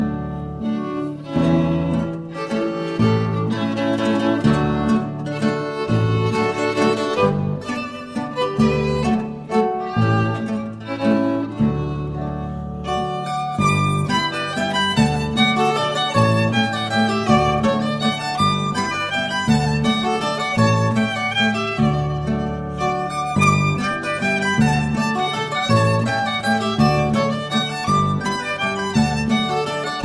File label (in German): Vorgeig Landler